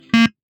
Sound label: beep sound; lego island